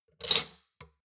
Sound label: shot glasses grab multiple soft, gastronomie; berufe; soundpool